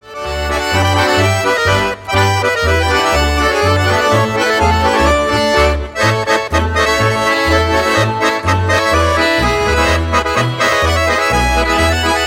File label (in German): Eckstein Schottisch